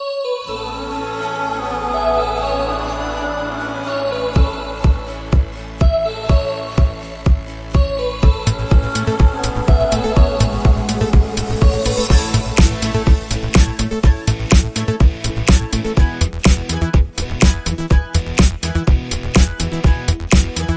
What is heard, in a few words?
Disco